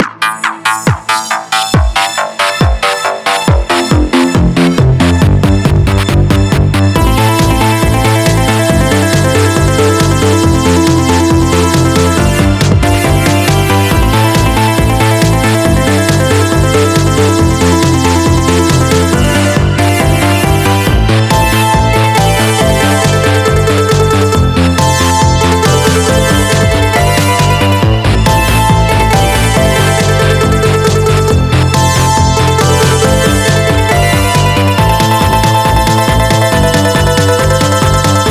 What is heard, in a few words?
DARK BATTLE EDM ver